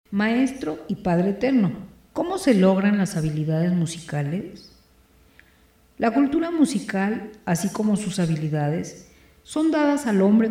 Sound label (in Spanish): Violinista